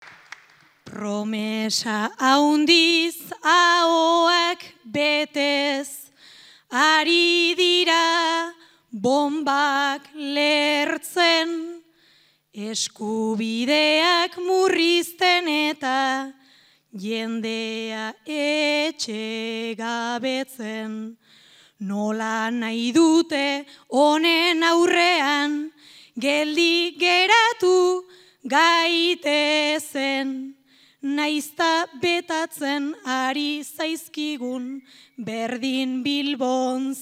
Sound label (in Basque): txapeldunaren agurra, bertsoa; gasteiz